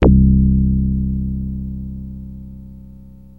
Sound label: Access Virus B; Synthworx